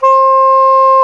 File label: SAX SOP MF C, Soprano Sh